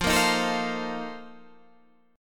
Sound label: A sharp Major 7th Suspended 2nd Suspended 4th